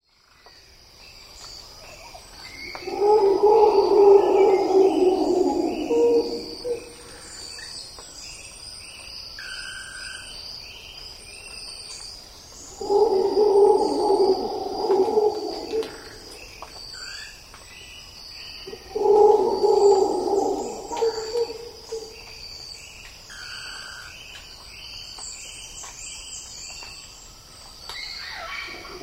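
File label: old growth tropical rainforest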